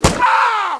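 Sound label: flesh hit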